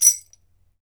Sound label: BEL RATTL, African Perc